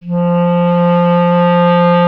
clarinet F; Miroslav Vitous; Solo Instruments VOL-1

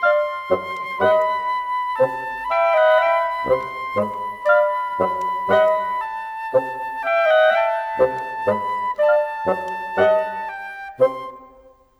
Cinematic Winds; Sonic Foundry (Sony Creative Software); Orchestral Series VOL-3; Cinematic 22

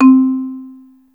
marimba, Yamaha Motif ES drum machine; 300 Drum Machines; Yamaha Motif ES-Series

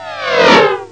space warp; ST-XX Amiga Soundtracker Sample Packs

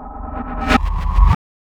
drbreak, drumbreaks; Masterbits; Vinyl Attack

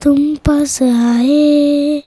CHILD VX C, Indian Traditions

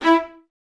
String Ensembles, Violas

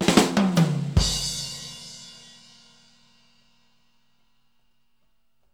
Fills, Monster Beats; Zero-G

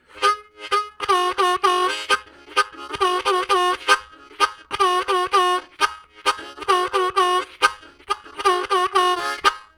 Flat 7, Harmonica Riffs; Zero G

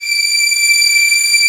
VIOL, ORCHESTRA; AKAI S6000 CD-ROM - Volume 1

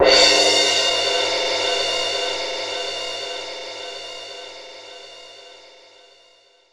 Crash Cymbal; Roland M-OC1

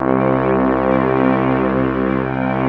BRASS STR C2, Analog Fantasies, KORG; USB Soundscan vol.49; AKAI

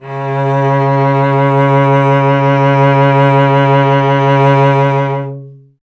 STR cello C#, Cello; Miroslav Vitous; Solo Instruments VOL-1

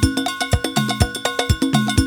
SOCA BREAK B, BREAKBEATS; Crypton - DrumBase5000

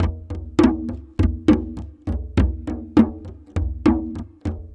Java Gourd Slow Harmo; Ambient; Sonic Foundry (Sony Creative Software); Ambient Atmospheres & Rhythms

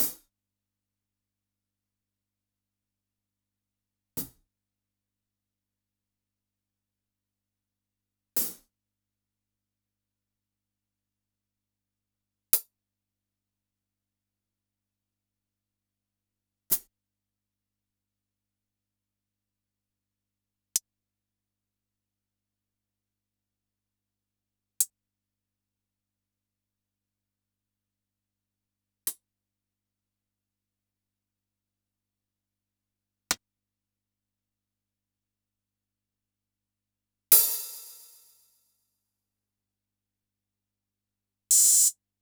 Yamaha DD-65 HH

